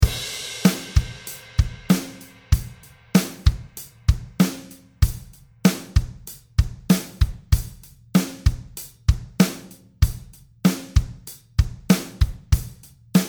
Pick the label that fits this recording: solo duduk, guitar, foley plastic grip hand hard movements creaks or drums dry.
drums dry